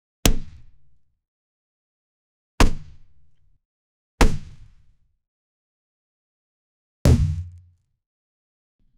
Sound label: impact frame loud stomp; punch